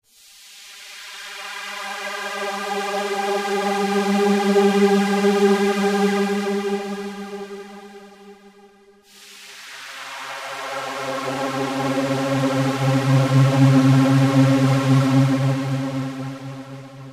Synthex hp sweep

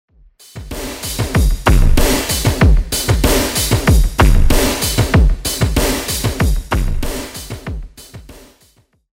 dirt

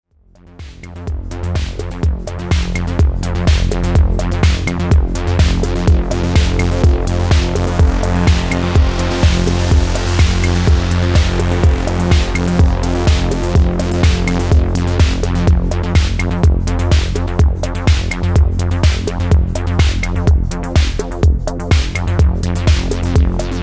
analog filters, Synthex

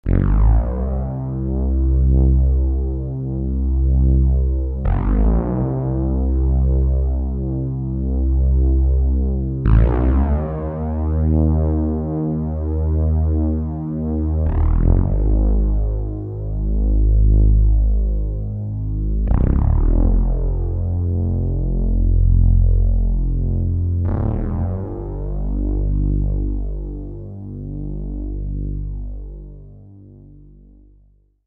bass, Synthex

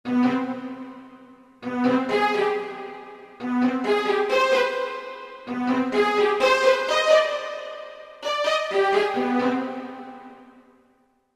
d-50 arco strings

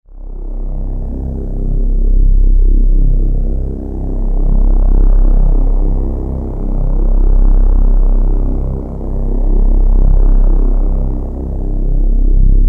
D-50 deep bass

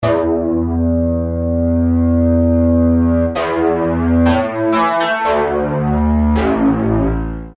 Synthex sync ring mod

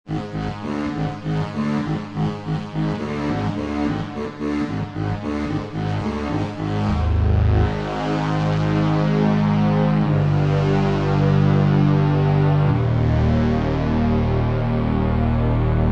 D-50 motor orchestra

